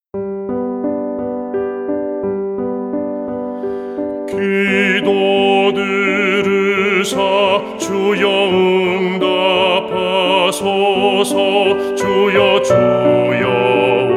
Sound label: BASS